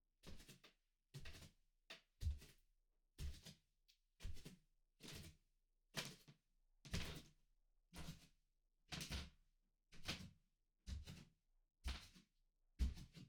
行ったり来たり 低速, 足音 裸足; 3DIO

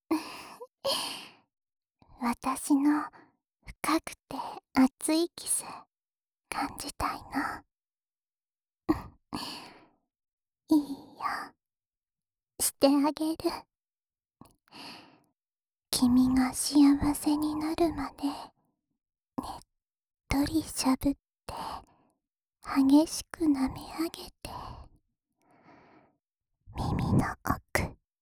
深い左の耳舐め, ASMR; 私の本気のキス……いっぱい味わって?

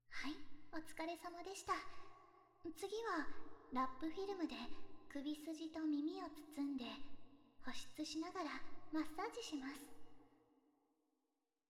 お耳ラッピング, ASMR

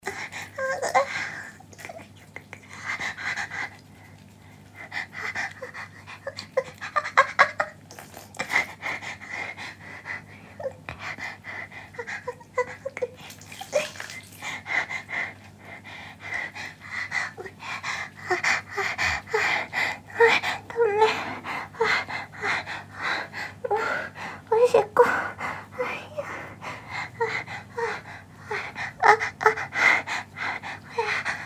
おしっこを漏らしながらのオナニ－

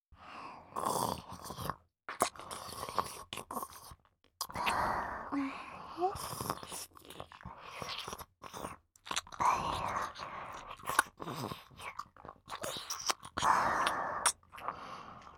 ひそひそと囁かれ、耳舐め, ASMR; ひそひそぺろぺろ

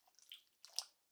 ペニスを引きぬく; アダルト系

